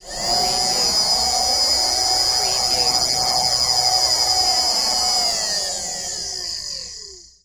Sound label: Servo Motor; sound effects bible